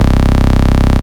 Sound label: Bass Shot C